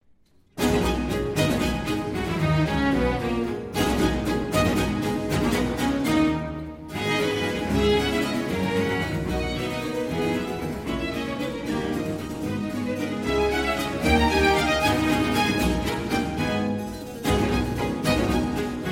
Concerto for Strings in A Minor, Allegro; RV 161; Vivaldi

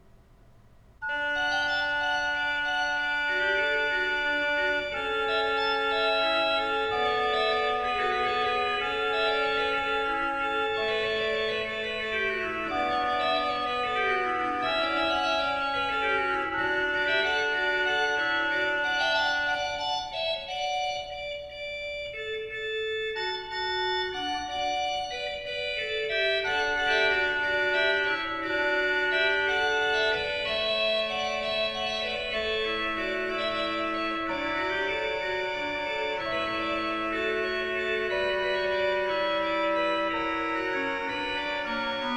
Choral Prelude, Organ; ''Vom Himmel hoch, da komm' ich her''; Johann Pachelbel; Complete Organ Works

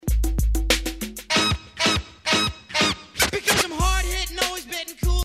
Scratch

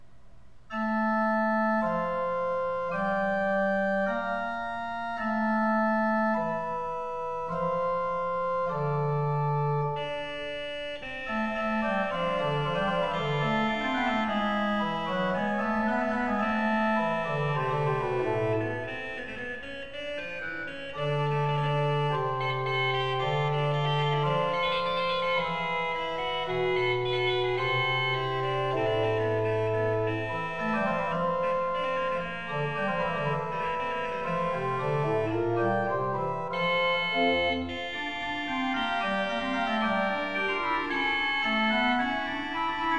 Fugue, Organ; Magnificat primi toni; Johann Pachelbel; Complete Organ Works

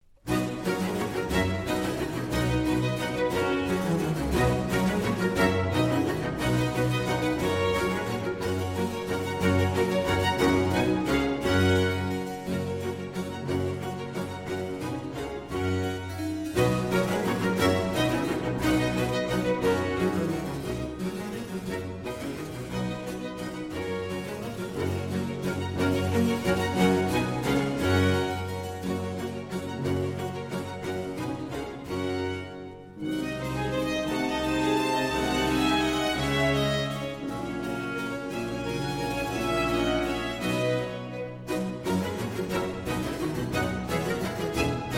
Concerto for Strings in C Major, Allegro; Vivaldi; RV 110; Arie per tenore

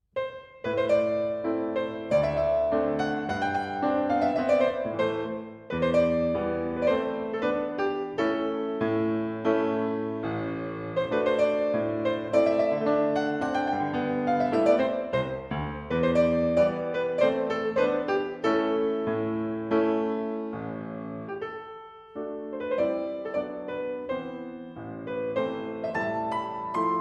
Romanian Folk Dances, Stick Dance; Béla Bartók

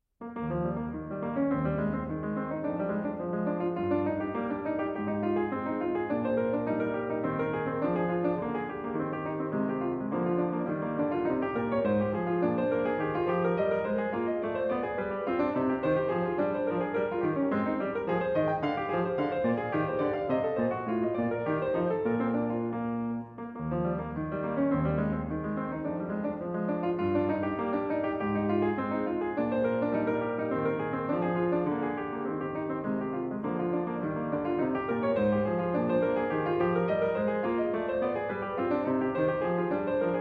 French Suite in E Flat, Allemande; French Suite No.4 in E Flat, BWV 815; Vladimir Ashkenazy; Bach French Suites, BWV 812-817

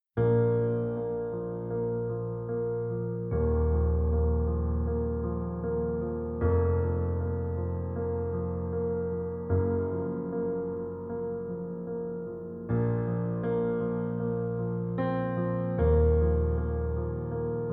Piano Classique Echoes

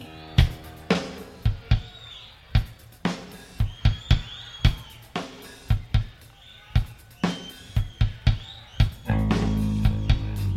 drum jam

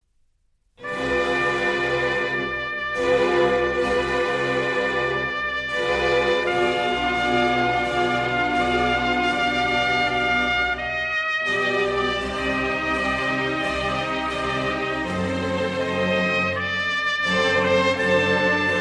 Oboe Concerto in C minor, Grave

